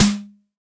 Yamaha TX-81Z SD

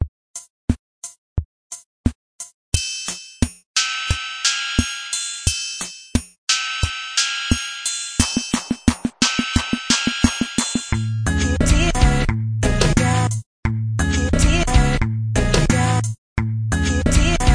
Hip Hop Beat; REMIXABLE COLLECTIONS; alex merced shorts

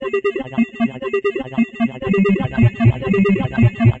bleeping voxy synth; SYNTH AND ELECTRONIC LOOPS

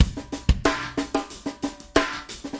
092 bpm funki classic, drum loops and breaks

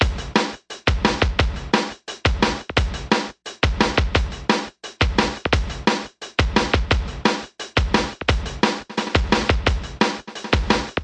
174 rollin zappin tekstep; 161 to 180 bpm

